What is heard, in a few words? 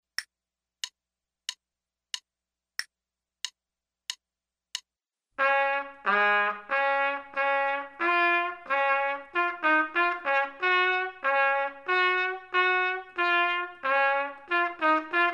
trumpet; Selected Duets for Trumpet Podcast